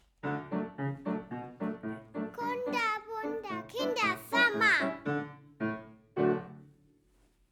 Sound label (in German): Jingle Kunterbunter Kindersommer